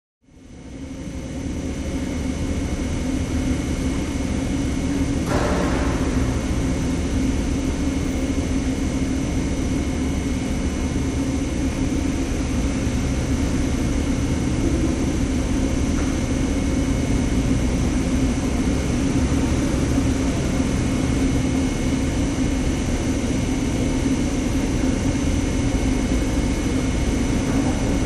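Warehouse Background 4; Warehouse Ambience; Fan / Motor Noise / Metallic Clanks / Air Release / Worker Walla / Backup Beeps, Medium To Distant Perspective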